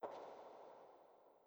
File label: marble reverb